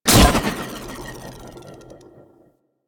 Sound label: Board Sword Catch; Sundial